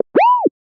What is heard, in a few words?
Opened Sound